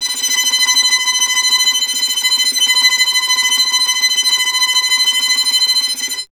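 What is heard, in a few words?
string violin tremolo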